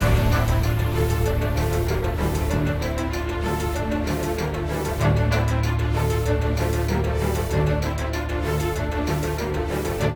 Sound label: Battle; ALTDEUS Beyond Chronos; Android